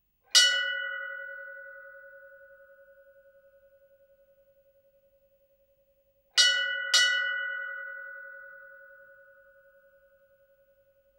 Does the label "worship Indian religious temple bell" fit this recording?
Yes